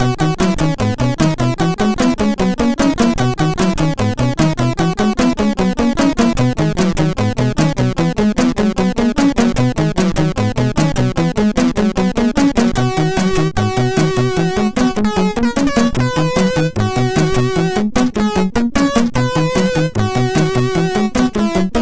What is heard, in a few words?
Crack Intro, MOS6581R4; sid; Barricade